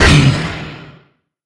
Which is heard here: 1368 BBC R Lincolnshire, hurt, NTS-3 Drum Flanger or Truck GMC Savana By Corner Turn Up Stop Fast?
hurt